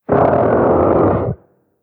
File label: damage; TIGERTRESHERb